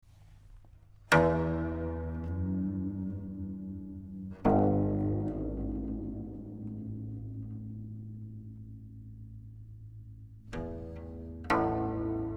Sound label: guzheng